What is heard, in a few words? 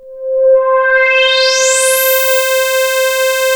MOOG, BASSES